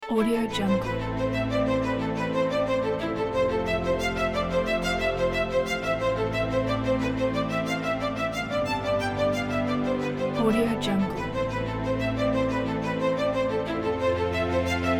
epic cinematic emotions pack; alversmusicproduction

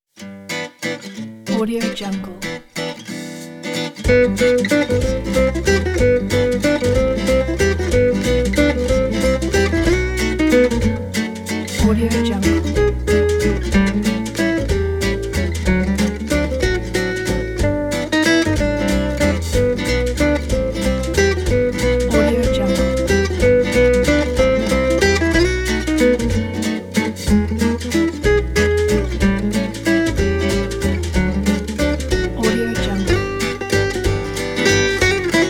gipsy jazz waltz

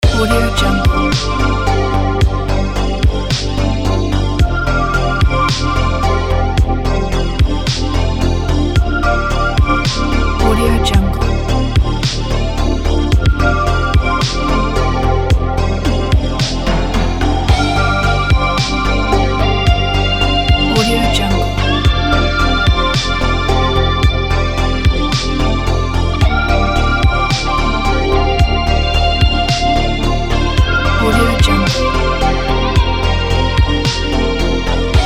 80s retro movie

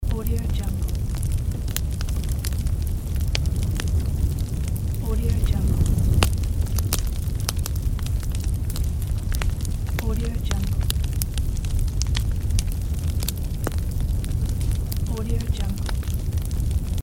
forest fire

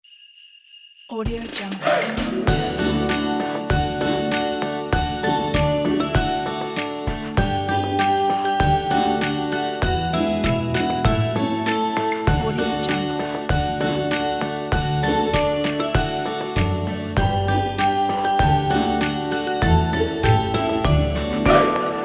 jingle bells remix

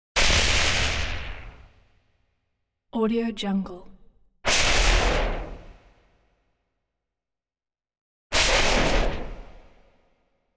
lightning strike sharp whipping bursts

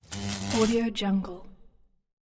animals insect beetle macraspis wings flap

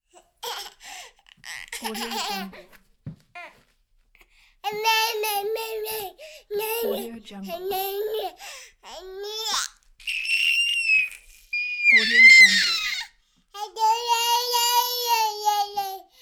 one year old child babbling playing

